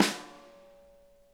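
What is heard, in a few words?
center, snare; Sonic Reality; Interactive Drum Kits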